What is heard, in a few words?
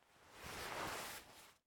Sit Sofa; game audio; MitaKind; MiSide